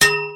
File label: metal post hit